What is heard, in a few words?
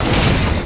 tank